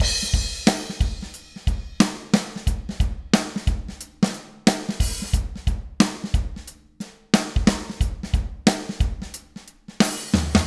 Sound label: Drums